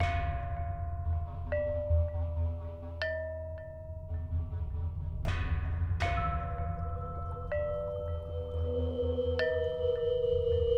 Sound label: Music Gameplay State Neutral; Sifu; H4 Level Area=Cave Lvl2